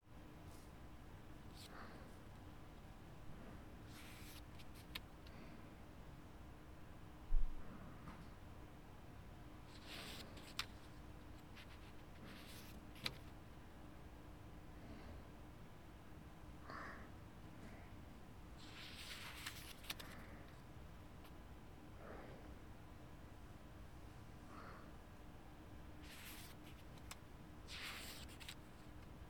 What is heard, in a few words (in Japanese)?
密着漫画読みASMR; めっちゃ近いよ、顔…